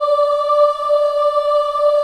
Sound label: D4 CHILD OH, Choir; USB Soundscan vol.28; Acoustic & Synth; AKAI